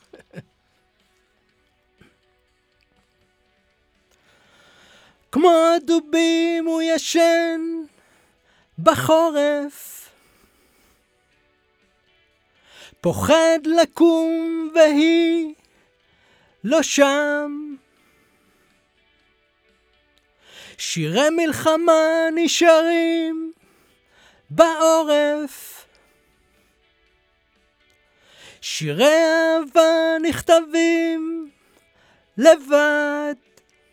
VOC

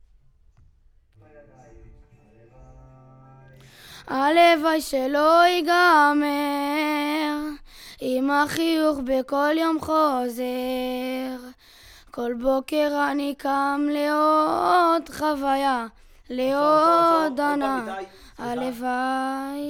VOC

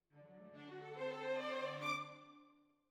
Violins Over

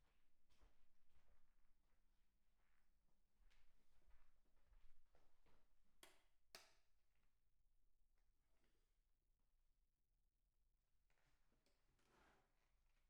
PERC OH

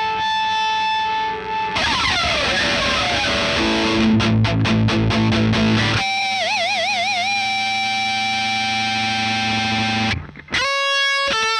Marshal Gtr Line

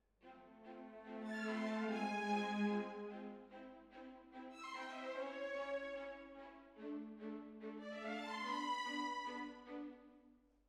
Hall

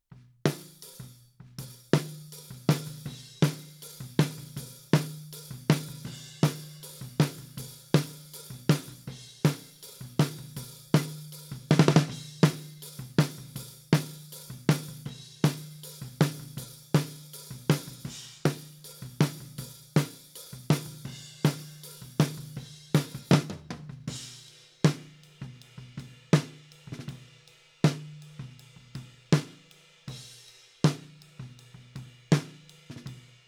Snare Top, 160 BPM

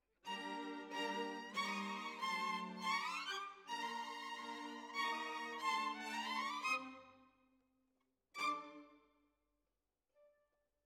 Violins, jazz for piano

